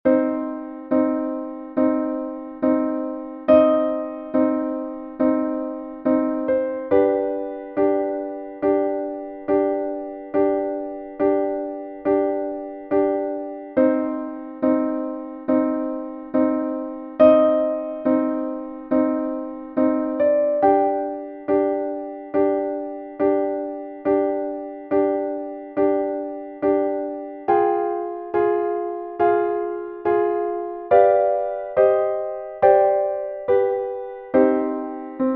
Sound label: sad idea